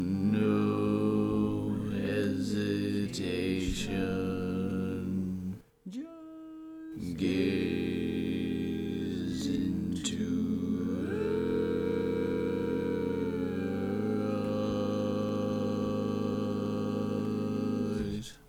Bass, barbershop tag

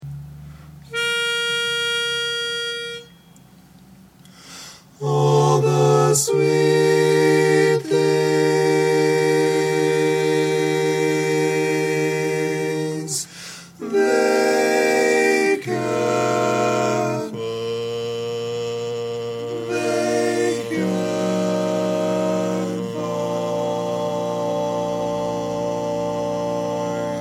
Lead, barbershop tags

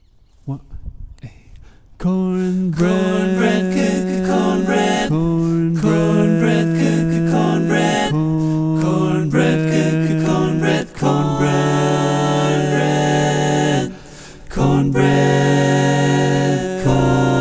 All Parts, barbershop tags

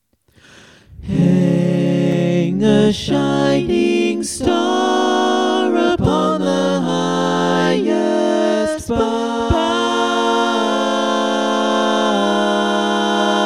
All Parts, Barbershop Tags

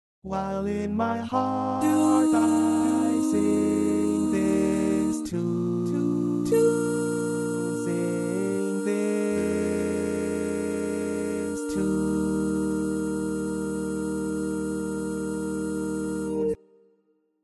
Tenor

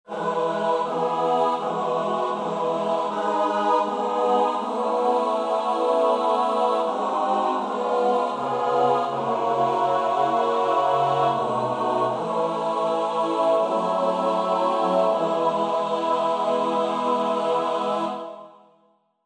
barbershop tags, all parts

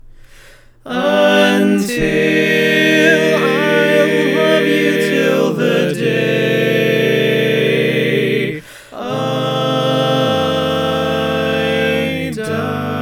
All Parts, barbershop

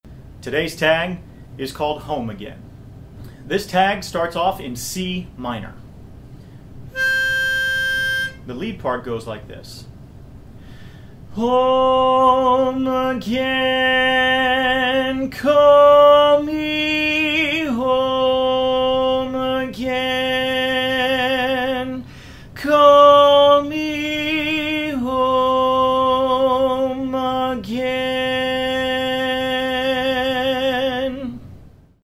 Lead, barbershop

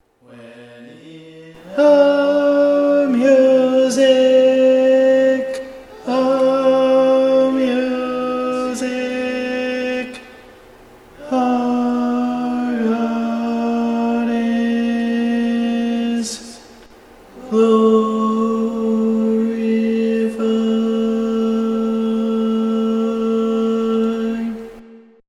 Lead

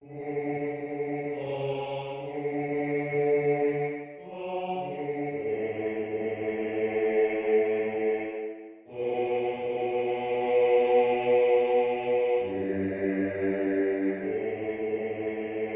bass

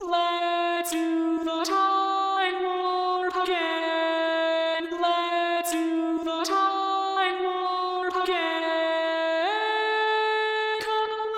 Lead, Barbershop

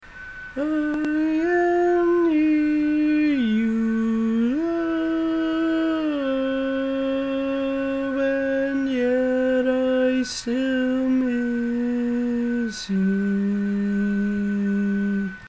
Lead, barbershop tags

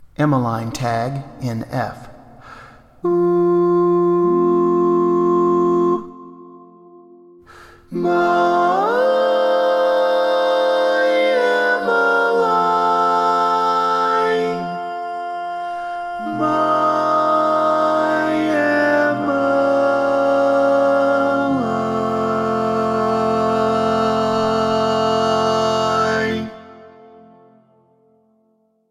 Tenor, barbershop tags

